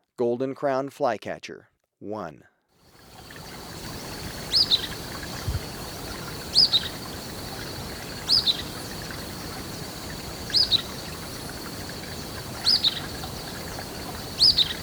Golden-crowned Flycatcher, Birds of Ecuador; S. America

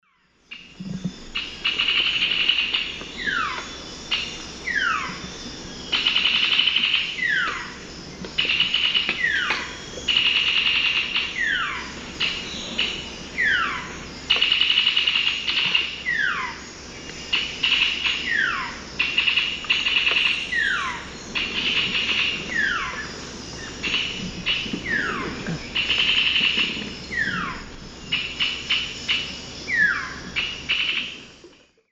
Cymbilaimus lineatus, calls; S. America; Eastern Lowlands

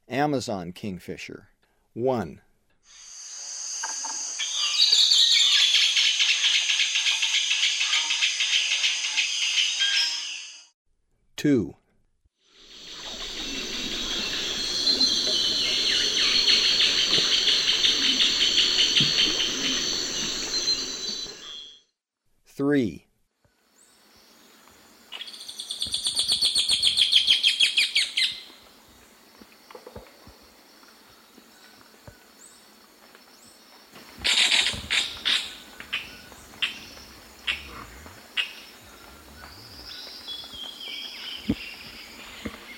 Amazon Kingfisher; S. America; Eastern Lowlands

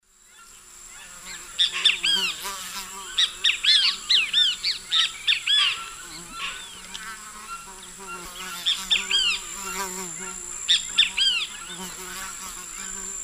Pitangus sulphuratus, songs 2 birds; S. America; Eastern Lowlands

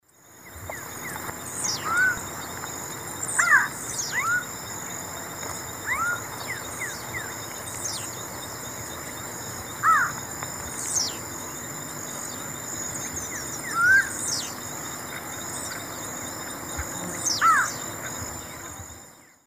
Thamnophilus doliatus, calls female; S. America; Eastern Lowlands

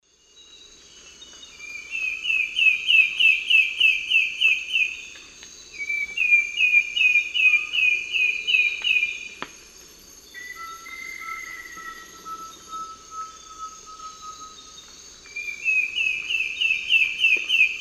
Myrmeciza fortis, duet; S. America; Eastern Lowlands

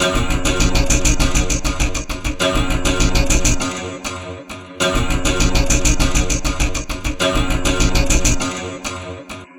Chugging Strings; Electronica

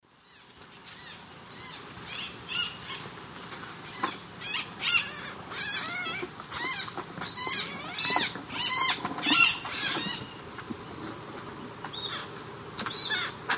Buteo magnirostris, calls and song; S. America; Eastern Lowlands

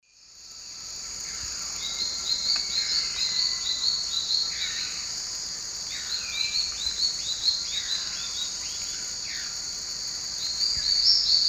Hylophylax naevius, terra firme, duet; S. America; Eastern Lowlands

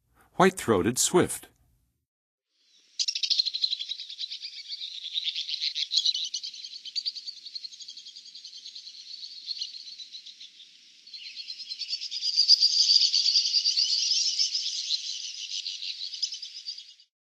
White-throated Swift, Western USA Songbirds; North America

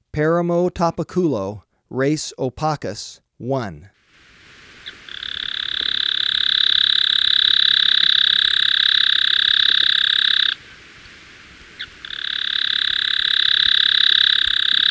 Paramo Tapaculo; S. America

